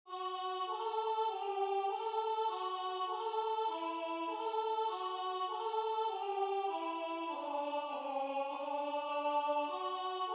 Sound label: Soprano